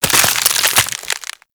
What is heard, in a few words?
Ice Freeze Hit; Warframe